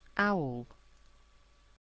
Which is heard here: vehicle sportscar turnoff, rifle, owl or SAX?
owl